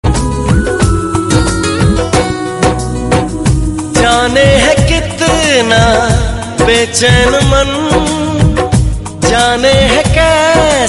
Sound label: Romantic Hindi